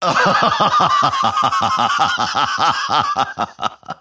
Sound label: grimstroke laugh; dota